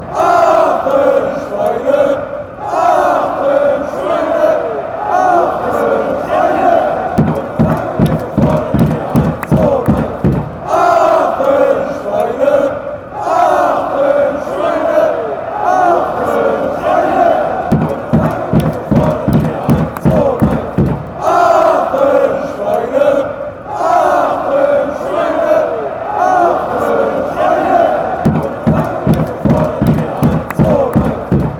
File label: pigs, fan chants; aachen